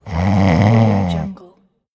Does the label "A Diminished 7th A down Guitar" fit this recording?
No